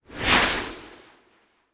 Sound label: Crystal Catalyst Blow